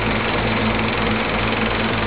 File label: cinema